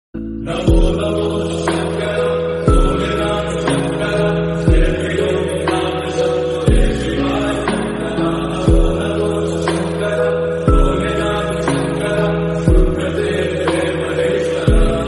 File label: lofi hindi boosted ringtone remix